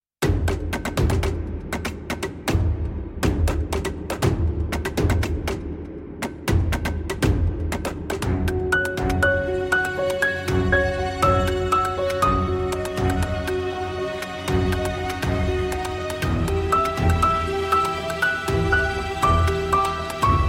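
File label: Pure Piano; Video Game Version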